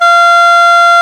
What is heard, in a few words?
English Horn G3